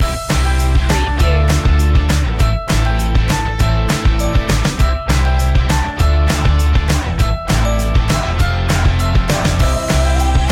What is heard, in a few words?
upbeat rock loop